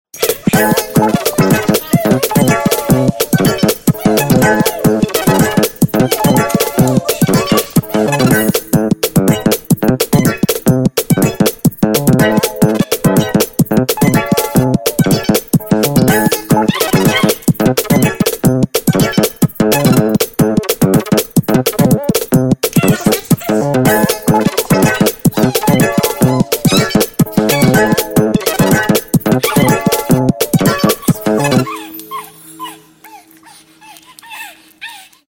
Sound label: groove